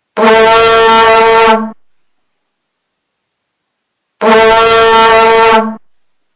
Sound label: vuvuzela